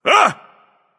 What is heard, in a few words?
nv fear, vox; smite; voicepack; vulcan